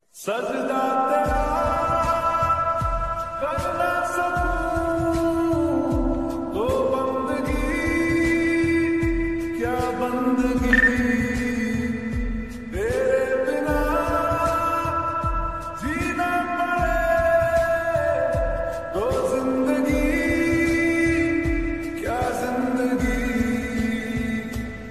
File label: new sad song ringtone